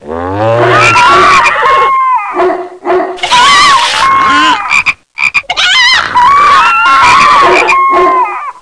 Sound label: animals